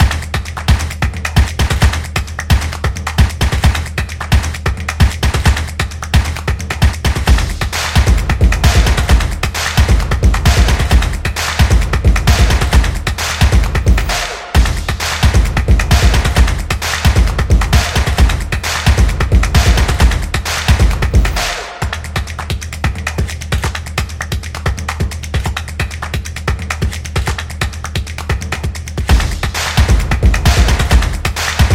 Percussion Intro

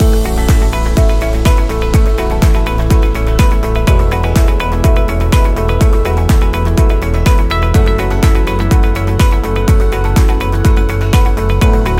Real Estate Loop

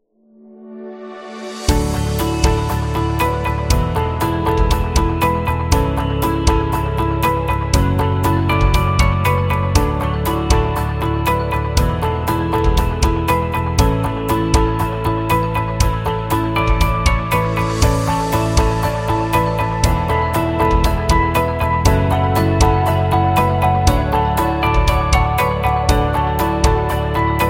Informational

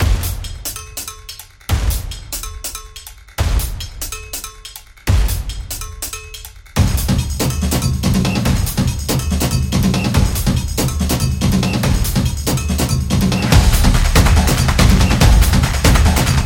Fast Beat

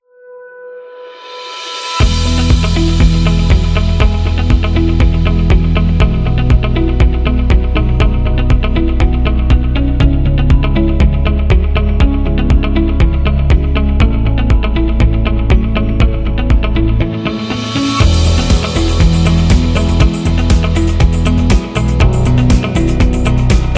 Corporate Upbeat Happy

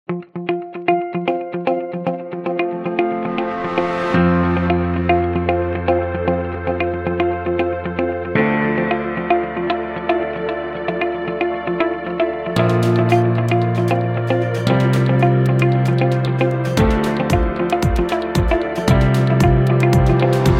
Buildup

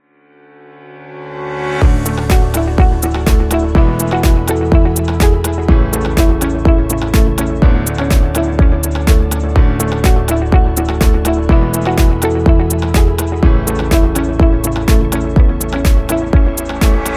Corporation

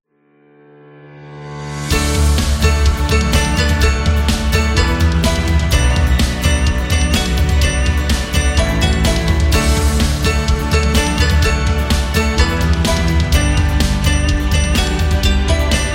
Chinese Rock; sounds of the world

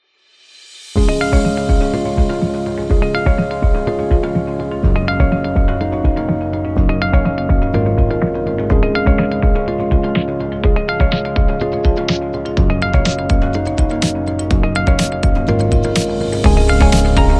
Corporate

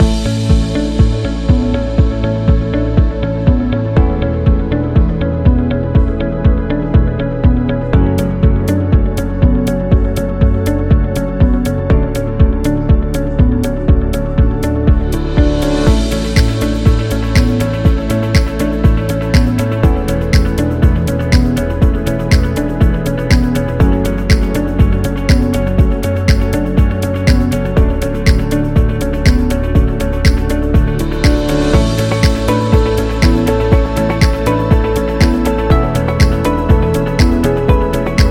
Inspiring Loop

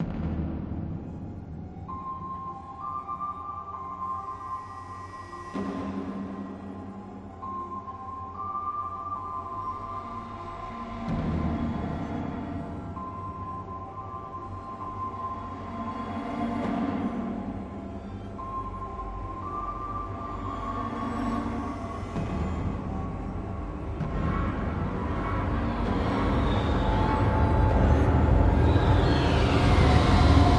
Thriller

